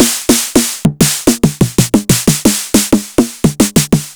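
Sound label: Roland Tr-909 snare drum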